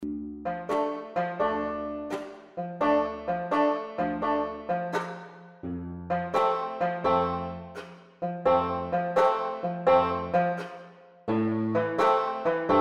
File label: banjo